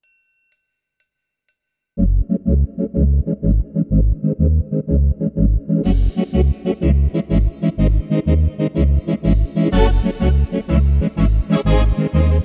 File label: style ballroom theatre march, Yamaha tyros3